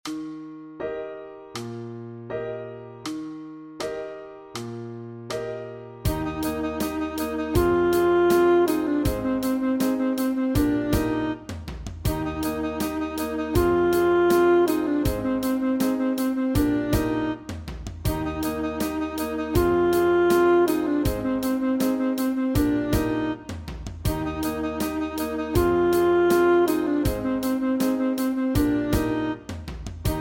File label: Sax Study